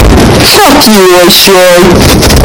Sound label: bass